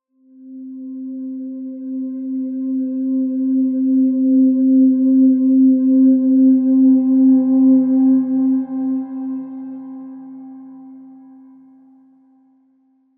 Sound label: Tone A; Tones